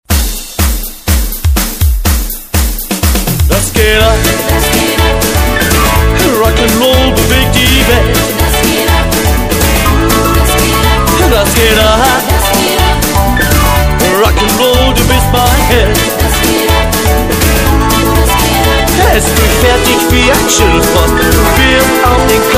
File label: rocknroll